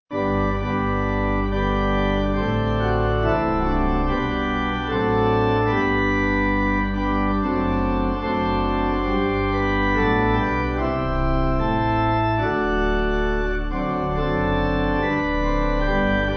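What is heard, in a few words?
Pipe, pipe organ; And Didst Thou Love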